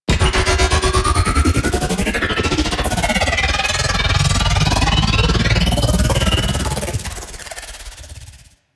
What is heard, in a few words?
Object Orb Charge Start; Warframe; Infested